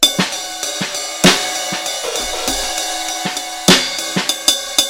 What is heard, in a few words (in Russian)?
akusticheskij barabannij brejk zaciklivaemij